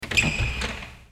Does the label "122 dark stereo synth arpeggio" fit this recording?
No